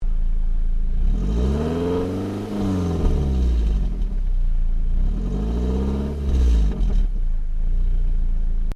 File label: Car Ford Focus Idle Rev Exhaust